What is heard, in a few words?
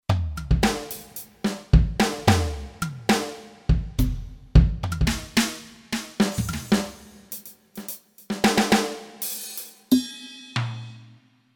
Extra Perc Drums, Kurzweil K2500X